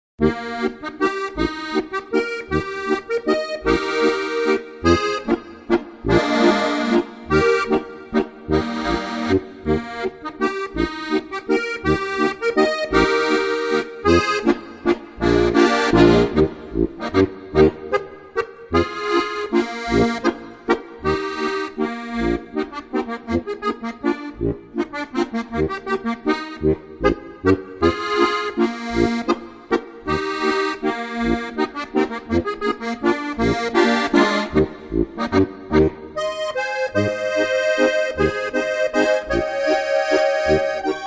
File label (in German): Walzer